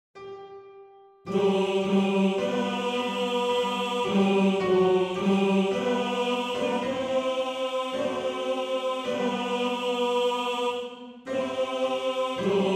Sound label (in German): Tenor; Tenor 2